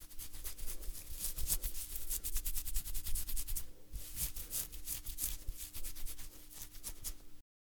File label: Head Scratch